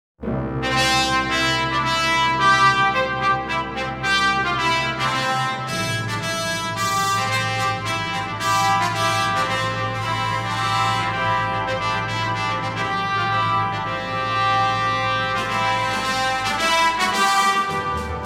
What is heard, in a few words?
Tpt Fanfare